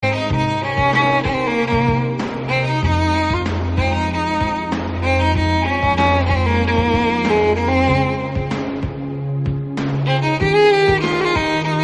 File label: violin music